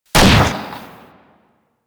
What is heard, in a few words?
Bronco Fire Shot 2D; Warframe; Weapons